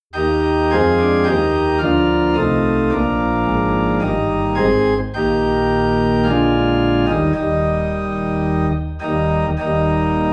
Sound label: Organ Low in E Flat; christmas; O Come All Ye Faithful